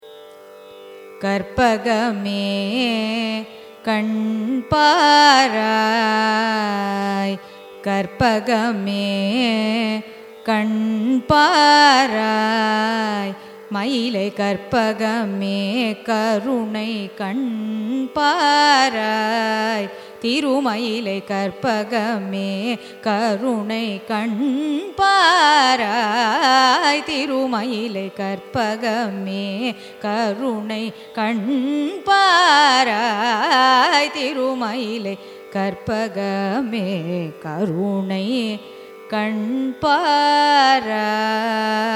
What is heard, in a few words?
raga madhyamavati carnatic; carnatic music